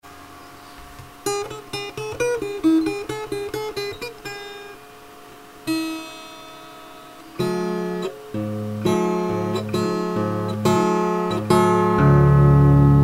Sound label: drumsticks